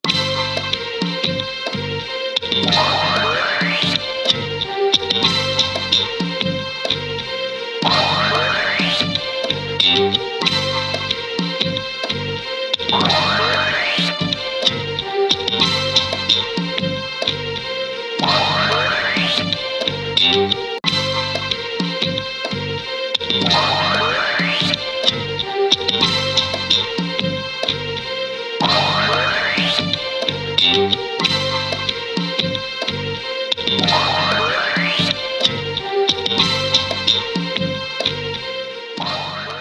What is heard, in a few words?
Karaoke Version